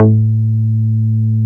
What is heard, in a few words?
GRAND A2, BASSES